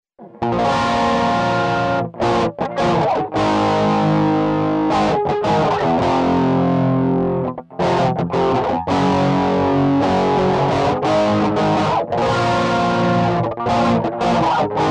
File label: CRUNCH, Digitech RP200; Factory Presets